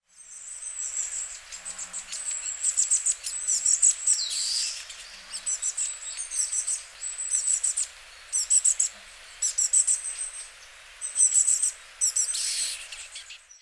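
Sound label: enaga, Long-tailed Tit; hyogo; koe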